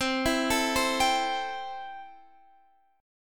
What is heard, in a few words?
C 6th, C, down, Guitar, Standard; C6 arpeggiated